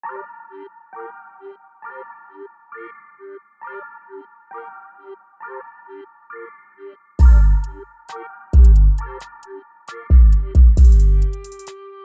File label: big boss type beat